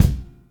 bass drum g key, Royality free bass drum sound tuned to the G note. Loudest frequency: 538Hz